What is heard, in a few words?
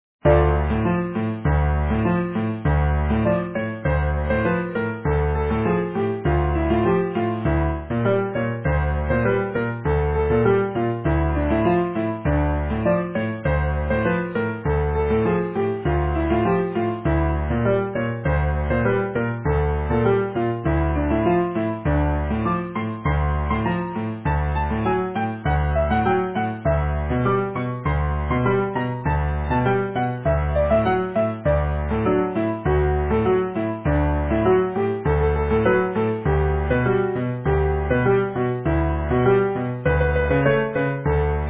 Habanera, Easy Piano